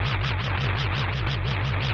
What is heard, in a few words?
Tailpasaran Fly; Zelda; OOT